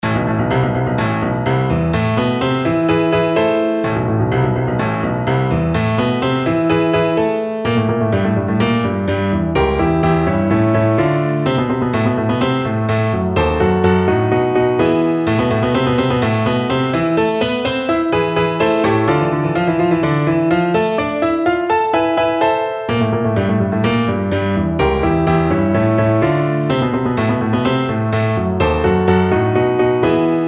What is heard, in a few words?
knecht ruprecht, piano